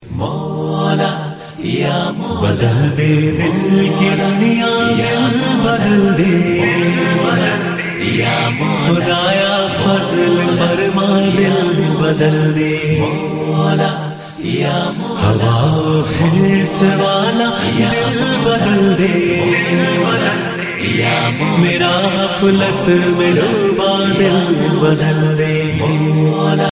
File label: naat ringtone